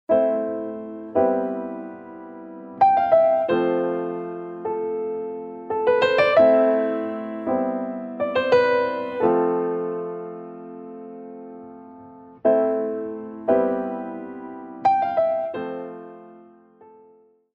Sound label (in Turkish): santral fon muzikleri